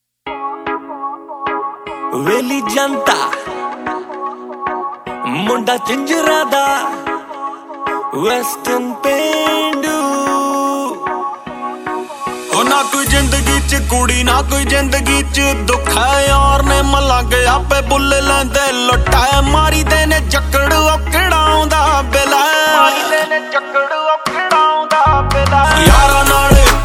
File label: Punjabi songs